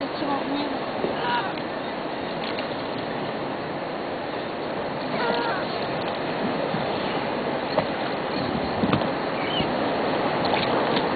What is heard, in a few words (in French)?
oiseaux